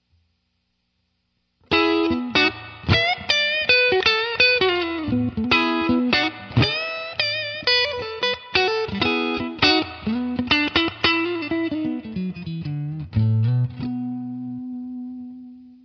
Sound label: blues, guitars